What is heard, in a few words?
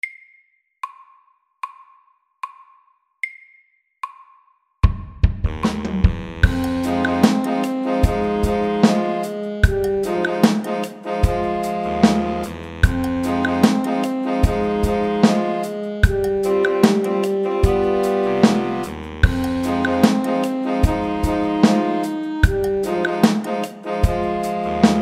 Funky drums SLOW 75